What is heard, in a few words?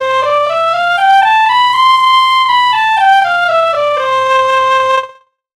octave up; テンポはそのままにピッチ調整; Pitch Switch